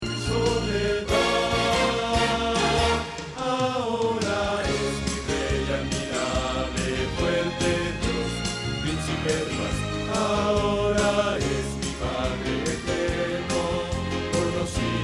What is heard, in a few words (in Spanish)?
Bajo